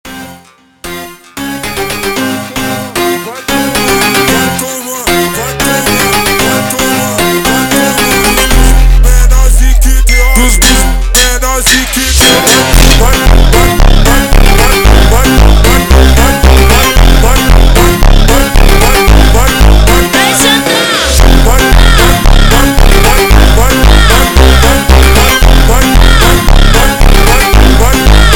Montagem super Slowed

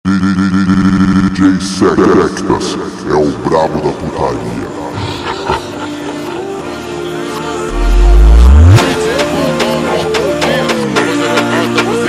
Analog Funk Super Slowed

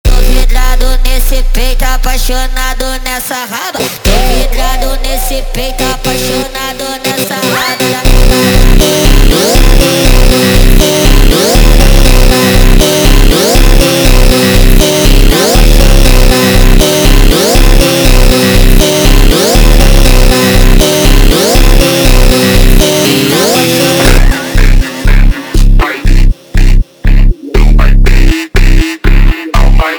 Montagem slowed

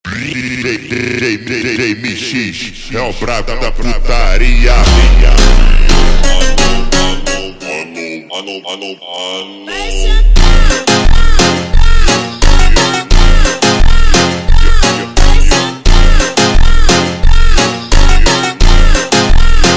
Montagem super Slowed

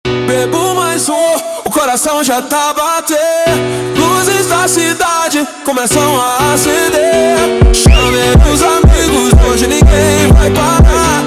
Montagem slowed

